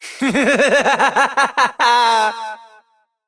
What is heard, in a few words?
Evil Twin Doppelganger Laughter; Battle Barks; Warframe; EntratiDoppelganger